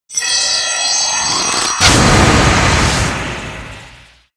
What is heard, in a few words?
Light Shot; Seer; GamigoNA